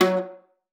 F#3, VIOLA PIZZ; Xsample VOL-5; 90 s Sample CDs

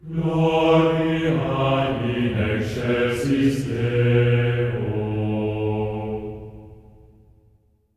GLORIA A3, Symphony of Voices; Spectrasonics; GR GLORIA

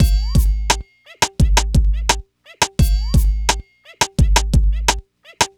DRM, Hip Hop and R&B Drumloops

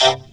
VOCODER DRUM

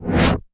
cinematic impact wipe

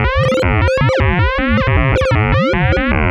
Random, LFO & Noise; Sonic Foundry (Sony Creative Software); Prototechno

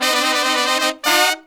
Horns 132BPM; Zero-G; Phantom Horns

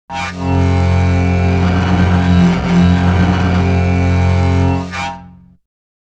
Cello Transformation; Bizarre Planet Sampling CD

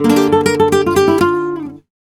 Guitar Picado, Flamenco, a#; Picado 1; Best Service ProSamples vol.46 - Flamenco

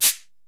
Shaker, Drums; ZERO G - Datafile DISC 1

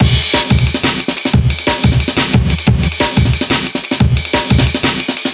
xlr amen phat, Amen Breaks

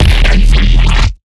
Growl Bass; neuro; BIG5AUDIO

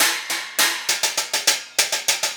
Cymbal and Hi Hat

